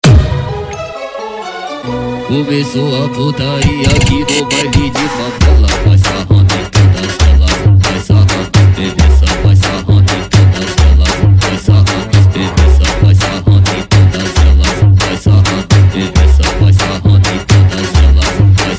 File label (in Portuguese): som automotivo funk bass